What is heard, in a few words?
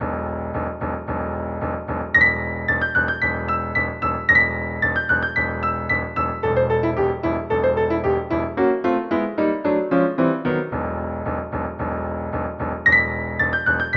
Oriental; Violin, cello, piano; synth